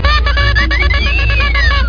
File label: toot toot